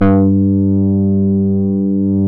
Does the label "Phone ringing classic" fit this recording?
No